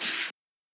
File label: paper